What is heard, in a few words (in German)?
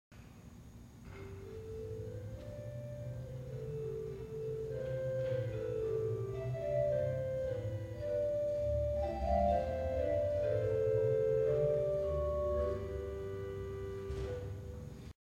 Holzgedacht 8', organ